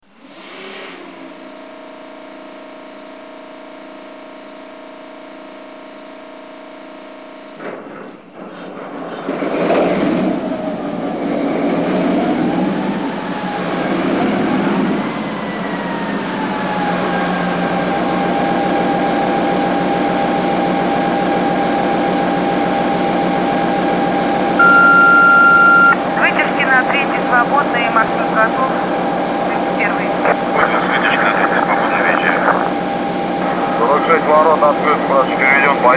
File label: M62 W Muffler